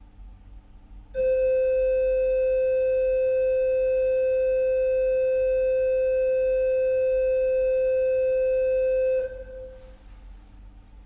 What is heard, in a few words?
flute 4 Pos; orgue; Positif; Ce petit orgue